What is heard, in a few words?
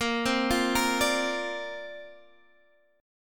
D-Minor 7th Sharp 5th Bb, chord